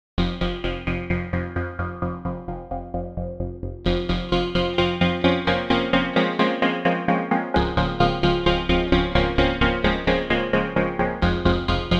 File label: Echo Flute Impro; Jupiter8; OPXPROII